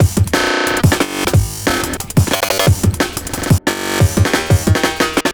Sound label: Blasted Funk, Drum Loops